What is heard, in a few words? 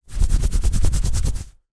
human itch scratch limb through clothing